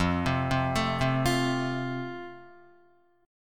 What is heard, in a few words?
F Major, Guitar; arpeggiated